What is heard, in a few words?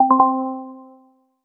a short synthwave tone